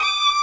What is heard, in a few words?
violin